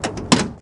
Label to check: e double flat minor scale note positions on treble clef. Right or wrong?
Wrong